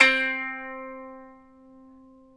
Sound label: ETH KANUN, Eth Plucks, Saz & Kanun; Club-50 - Foundations Roland